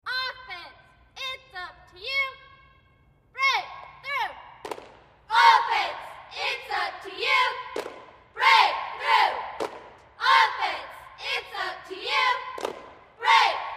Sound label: Cheerleaders High School; Cheer 5; Offense Its Up To You Break Through, Exterior Perspective